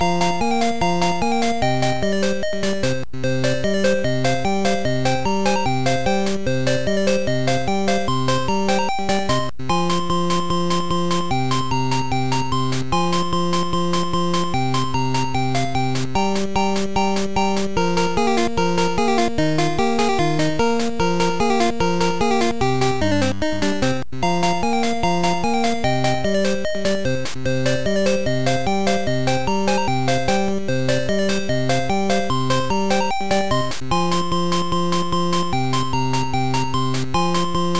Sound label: play ball background music; Gameboy Camera; Gameboy game rip